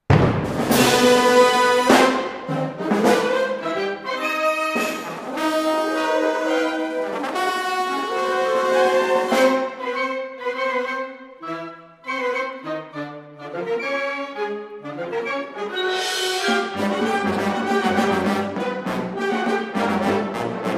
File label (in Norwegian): hornmusikk divertimento